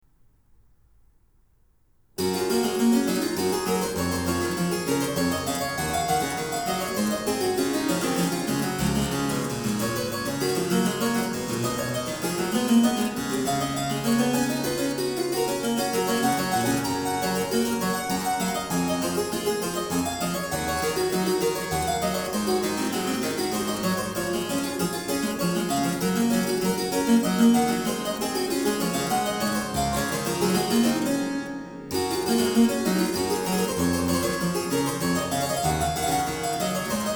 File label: Harpsichord